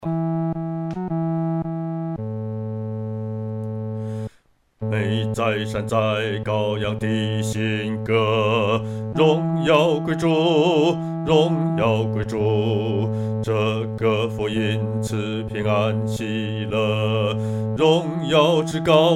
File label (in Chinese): 独唱 第四声